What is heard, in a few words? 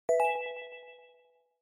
OS Sound Notification